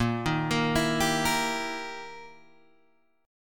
E Minor Major 7th Flat 5th, Bb

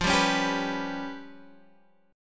G 7th Flat 5th

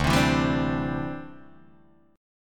D 9th Suspended 4th D down Guitar Open D

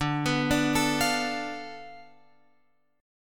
D Minor 6th Guitar Open F; Dm6 arpeggiated; JGuitar

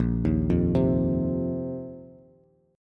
Bb Suspended 2nd Suspended 4th Bb down Bass; arpeggiated

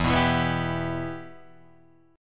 E Minor E down Guitar Drop D

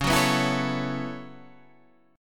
B 7th Suspended 2nd Suspended 4th, chord

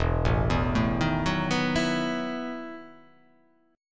E Major 7th Suspended 2nd Suspended 4th

